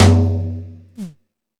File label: Toms, Drum Machines E-MU Pro-Cussion